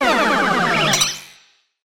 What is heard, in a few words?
Incorrect!; Paper Mario - The Thousand-Year Door (GC DSP)